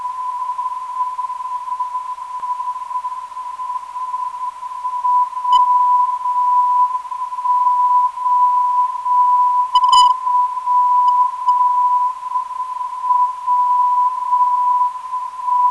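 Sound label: WOW OW OW 287kHz, non-directional beacon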